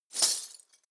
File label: glass